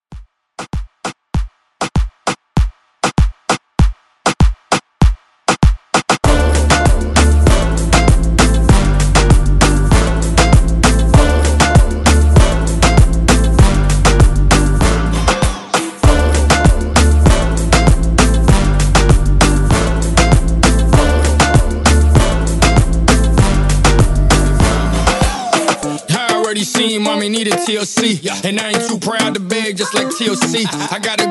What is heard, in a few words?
ReDrum, 98 Bpm; DJDOLLS